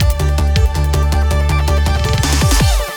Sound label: Snow Transition; sky rogue